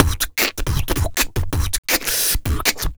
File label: Human Beat Box, Drum & Bass; Loopmasters